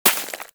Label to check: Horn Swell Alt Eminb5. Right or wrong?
Wrong